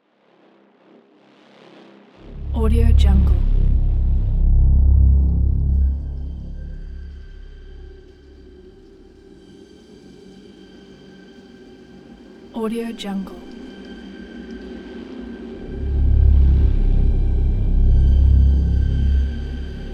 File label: doom drones 2 beyond the grey zone; soundmorph